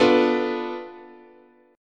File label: B Minor 7th Flat 5th, guitar chord